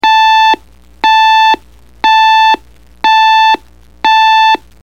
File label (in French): Reveil